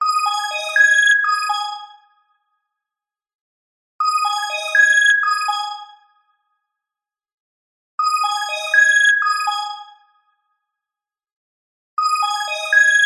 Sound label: multimedia ringtone smartphone musical high pitched